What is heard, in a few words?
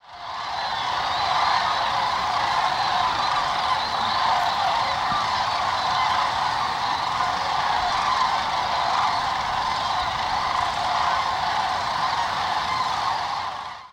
cranes; kids